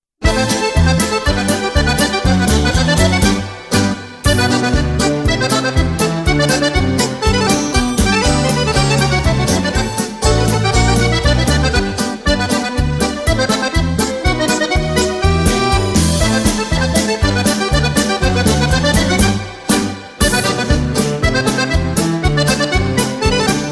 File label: accordeon